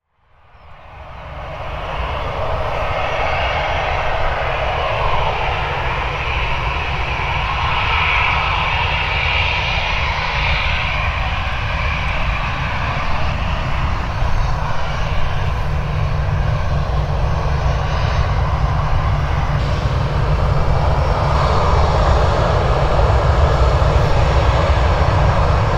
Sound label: Taxiing Aircraft, Jet aircraft Taxiing on runway.; Airplanes; Vehicles